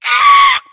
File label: warn, voice; video game; source engine; left 4 dead 2; spitter